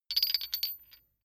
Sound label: metal chain medium handling